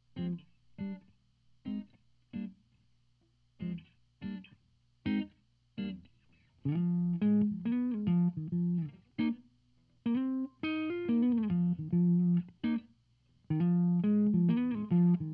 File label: Mic Signal reamp